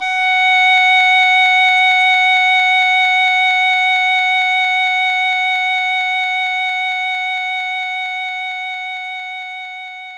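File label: English Horn F sharp 3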